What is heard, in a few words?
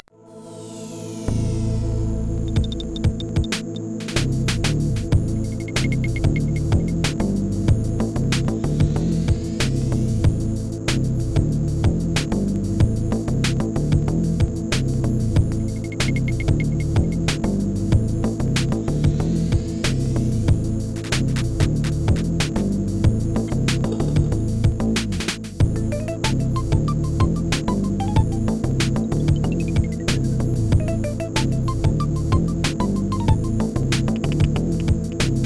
chiptune